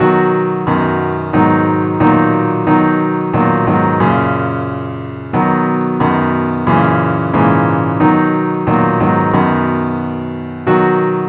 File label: keyb